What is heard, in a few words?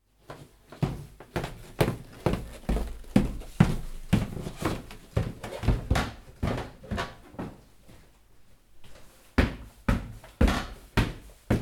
Stairs Panoramic